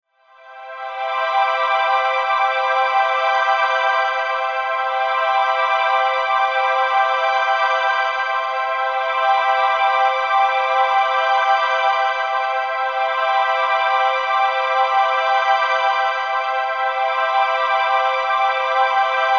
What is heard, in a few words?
Bed String Vox Swells Reverb Wash; Blastwave